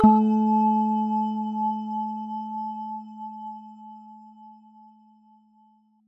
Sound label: chime